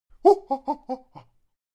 Monkey vocalization

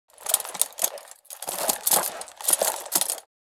Prison guardhouse drawer rummaging and searching

